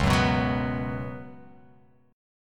C sharp 7th Suspended 4th C sharp

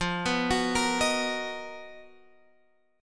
B Major 7th F, chord; Bmaj7/F; arpeggiated

